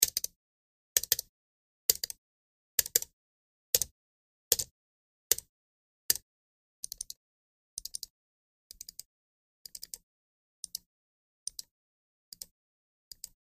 Mouse Clicks Multi

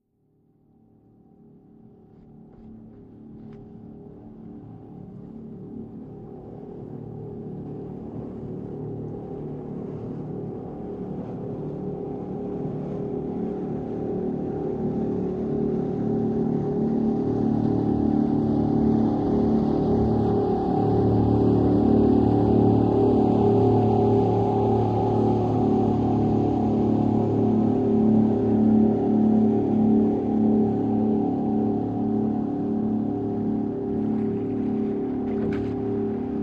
Military PBR Offshor

